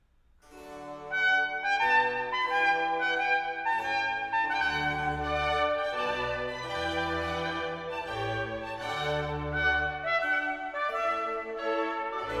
Concerto for trumpet, 2 oboes, strings & continuo in D major Largo